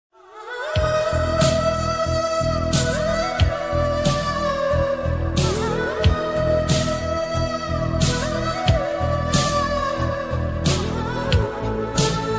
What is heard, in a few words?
humming bgm ringtones